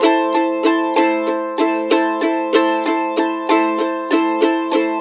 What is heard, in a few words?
Blues Guitar Samples, RIFF